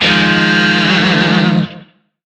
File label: Shawzin Min Chord F; Music; DE; Warframe; Lizzie Shawzin